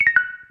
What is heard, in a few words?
Charge